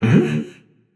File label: confused, voicepack; smite; jorm